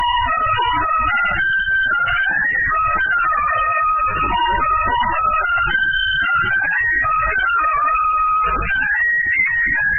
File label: the british grenadiers earrape